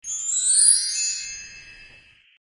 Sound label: respawn; horsey racing